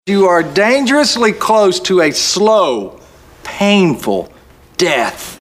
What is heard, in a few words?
death; cstrike; forlix